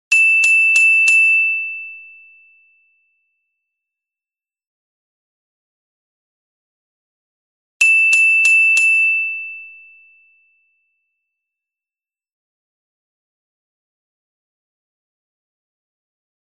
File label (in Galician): Carillon Soprano; Pombiña da paz; Unha peza para cada festa